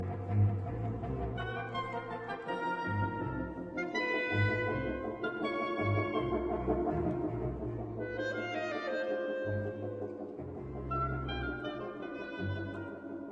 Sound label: Serenade in D minor, Finale Allegro molto, Ensemble